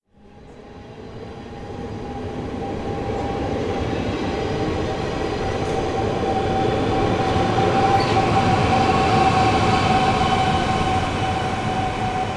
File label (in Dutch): Trein langs